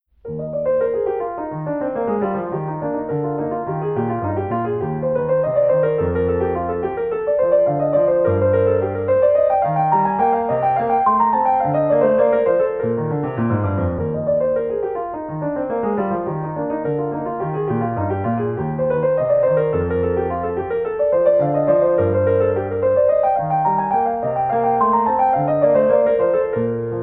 French Suite E-Flat Major Air; J.S.Bach; BWV 815

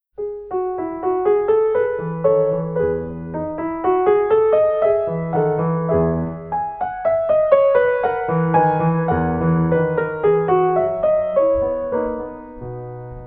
French Suite E Major Menuet; J.S.Bach; BWV 817

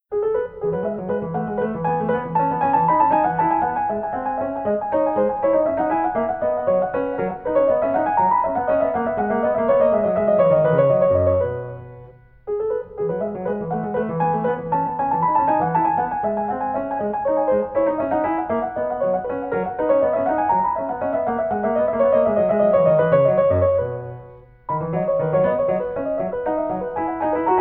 French Suite E Major Bourree; J.S.Bach; BWV 817

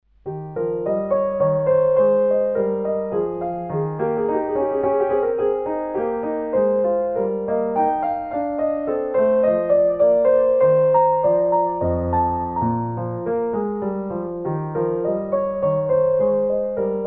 French Suite E-Flat Major Menuet; J.S.Bach; BWV 815

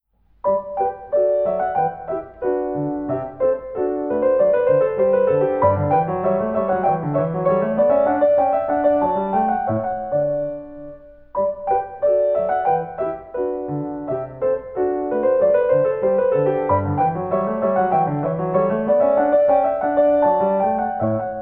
French Suite G Major Gavotte; J.S.Bach; BWV 816

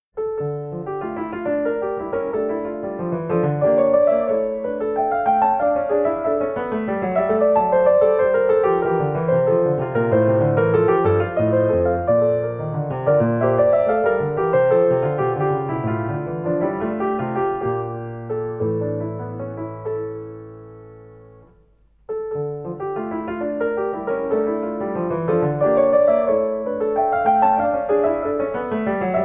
French Suite D Minor Courante; J.S.Bach; BWV 812

